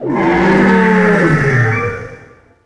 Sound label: dreadshark attack; DAoC